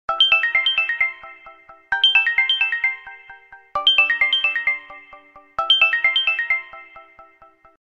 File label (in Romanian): Ton De Apel Samsung Galaxy Note Pure Tone